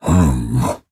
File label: Nonverbal Grumble; Ornn